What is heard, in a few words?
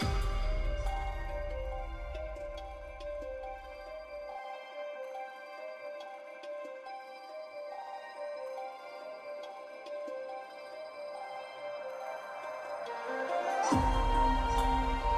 theme; porcelain